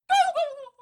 gobble

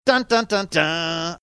singing first few notes

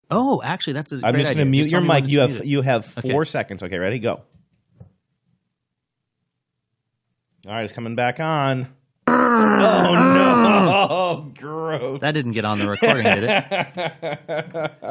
clear throat

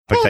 making funny high pitched sound

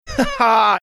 ha haa

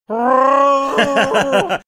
growling like chewbacca

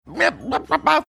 making marsupial gurgle like sound

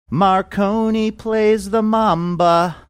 singing plays

